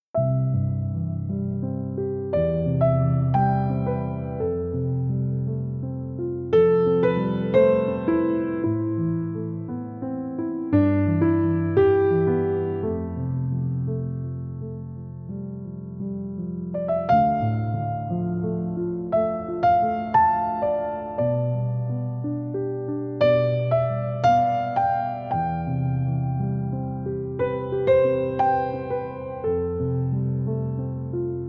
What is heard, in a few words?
song calm nature; dolphin tunes